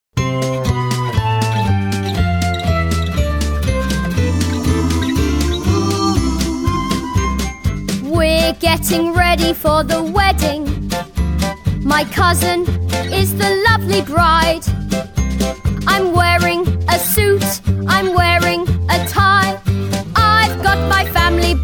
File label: karaoke